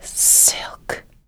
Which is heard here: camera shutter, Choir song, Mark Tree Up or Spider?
Spider